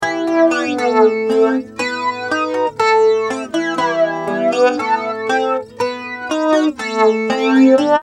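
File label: acoustic guitar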